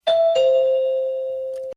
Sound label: doorbell